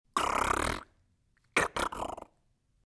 ouverture et fermeture de cutter; Fourniture

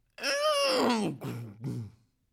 fermeture porte fenetre

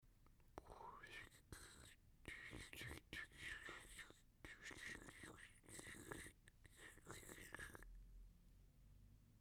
eau bas debit; Liquide; Robinet; mouth-fishing

